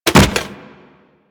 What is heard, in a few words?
Land Soft Default, Footsteps; Enemies; Warframe; Teshin